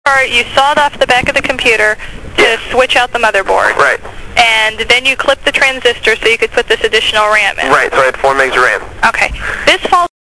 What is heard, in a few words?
Sawed Off